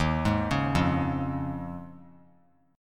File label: Eb 7th Suspended 2nd Suspended 4th Eb; Eb7sus2sus4 arpeggiated; JGuitar